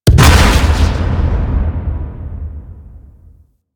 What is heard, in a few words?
Scaffold Explosion; Warframe; Railjack